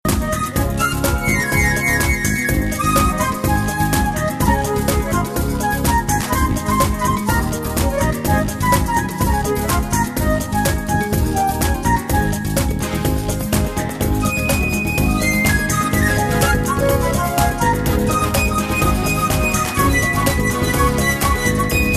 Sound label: Latin Dance